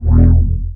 FIRE GOBLIN attack, game; Eft

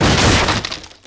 Crane Die

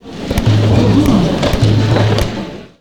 Open House; Eft

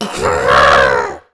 Hedgehog die

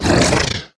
BLACKWOLF Hit, game; Eft

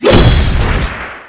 Sword; Eft; Weapon

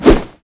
Axe; Weapon

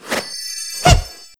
Attack; Eft; Fairy Melee

